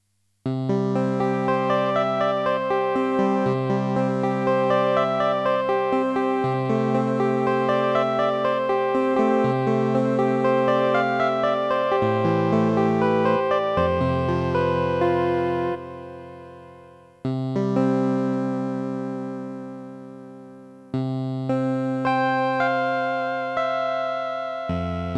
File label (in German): Casio MT 400V E piano mit Reverb und Chorus